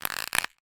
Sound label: foley rubber thin stress bend